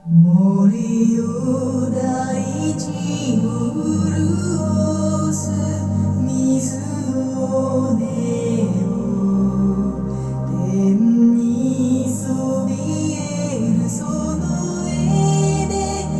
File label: music